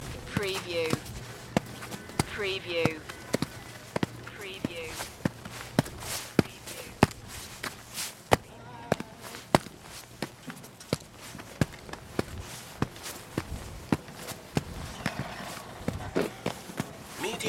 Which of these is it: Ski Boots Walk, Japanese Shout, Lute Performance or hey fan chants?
Ski Boots Walk